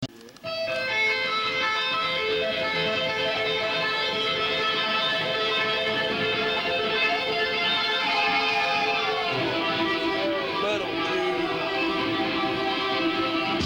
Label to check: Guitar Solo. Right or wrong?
Right